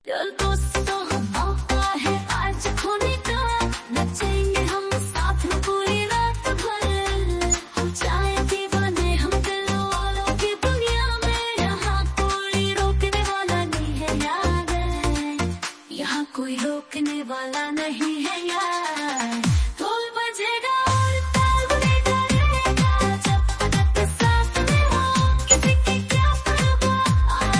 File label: Dhol bajega aur taal badhega